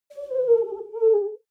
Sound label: foley clean window paper towel slow howl; smartsoundfx